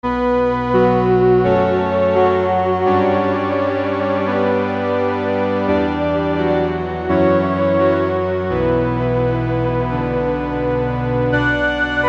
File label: epic lead